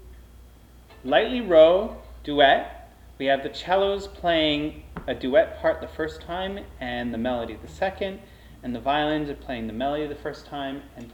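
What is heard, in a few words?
Lightly Row, Strings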